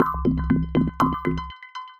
Digital Murmers, Synth Rhythmic; Ableton; Glitch and Wash

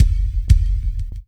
Reggae Beat Kick, Drums; World

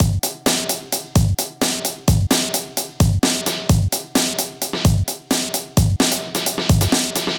DnB Beat 170bpm, MIDI Drum; Ableton; Factory Packs

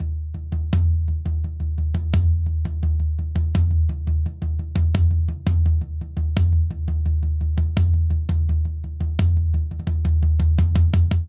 Toms Pop Floor Tom 85 bpm; 4 4; MIDI Clips; Ableton; Factory Packs; Drum Booth

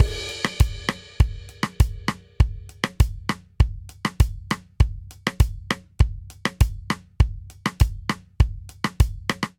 Reggaeton Verse 100 bpm, Drum; 4 4; MIDI Clips; Ableton; Factory Packs; Drum Booth

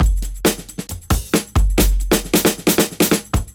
Drums; Suicide Drums; Ableton; Breakbeats by KutMasta Kurt

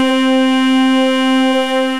A D&W Bell; Instruments; Wavetable; Synth Keys; Ableton; Synth Essentials

